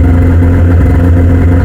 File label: idle, sled; WhiteOut